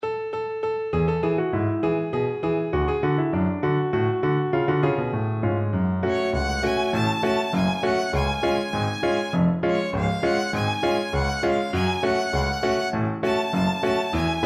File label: yom ze lyisrael violin